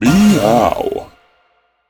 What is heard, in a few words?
cat; copycats; red